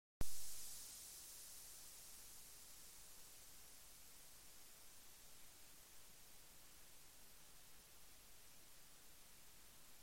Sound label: Morning Noise Broadcast